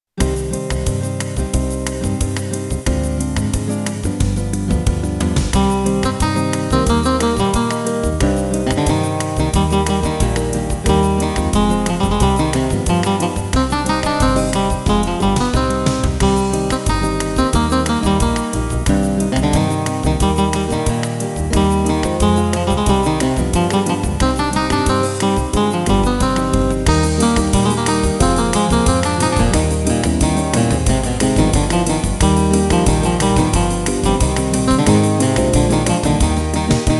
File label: Jazz